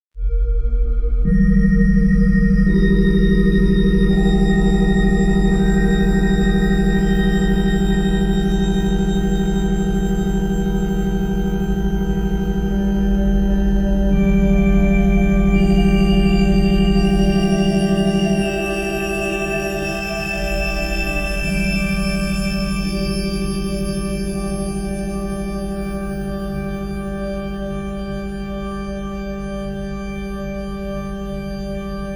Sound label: variable 5hz